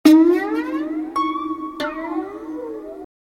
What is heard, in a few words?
Geek String